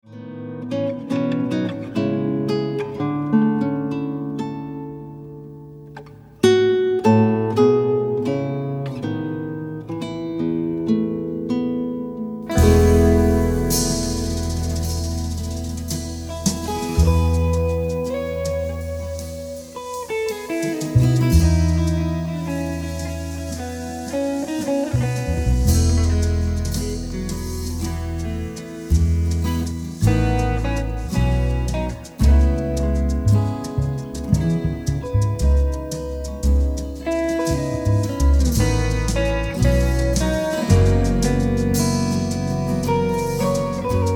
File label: guitar